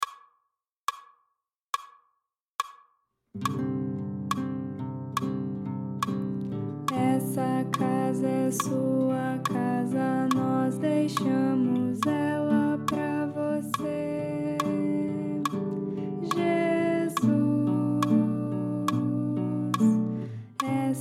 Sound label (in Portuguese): contralto